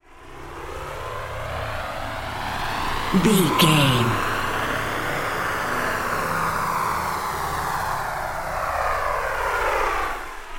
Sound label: ominous war plane heard courtyard